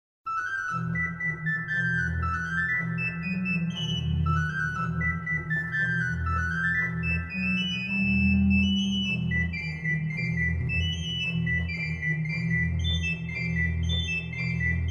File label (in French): doublette reverb